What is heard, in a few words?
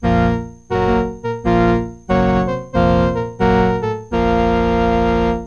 Doom March